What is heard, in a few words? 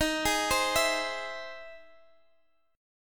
E Augmented Major 7th